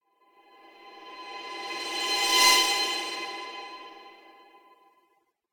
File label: Impact Metallic Medium Squeaky Impact - Passing; Hollywood Edge; sound designer's toolkit v2